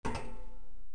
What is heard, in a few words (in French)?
pas homme metal; Time Machine; Bruitages